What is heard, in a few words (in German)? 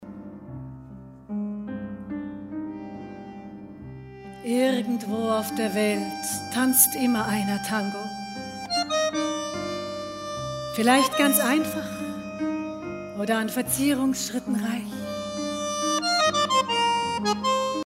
Tango